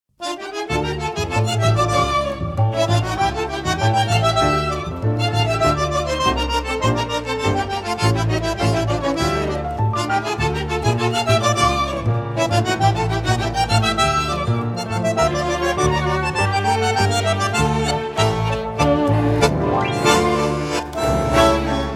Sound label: orquesta tango al galope